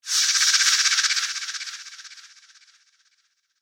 Creature Ghostly, Horror, Creature, Ghostly, Snake, Chopper, Hiss, Eerie; Monster Sound Effects; Blast Wave